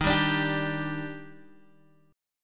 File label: Eb Major 7th Eb down Guitar Open D